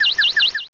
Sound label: Confused; pokerogue; battle anims